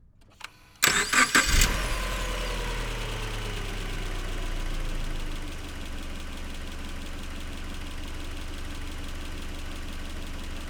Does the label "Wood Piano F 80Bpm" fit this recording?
No